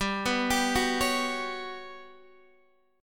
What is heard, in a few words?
G Major 7th Flat 5th, Guitar DADGAD